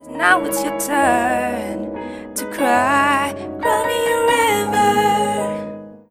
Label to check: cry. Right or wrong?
Right